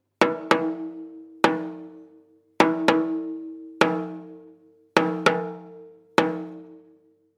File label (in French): impro tambourin; pour les petits; loto sonore